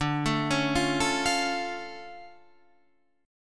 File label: D Minor Major 7th, D, Guitar, Half Step Down; DmM7